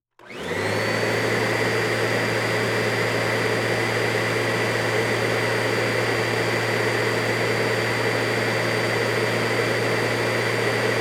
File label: Vacuum Cleaner On And Off, Household